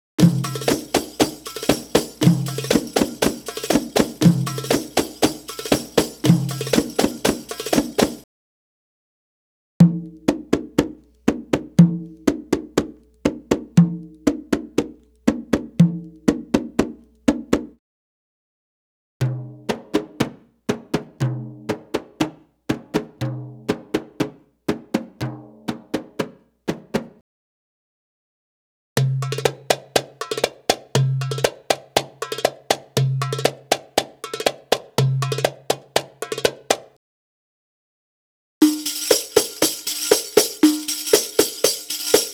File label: Arabic Rhythms; Hadeeth - Arabic Rhythms Sample CD